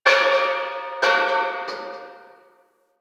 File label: Town Door Bells, Ambience; Warframe; Duviri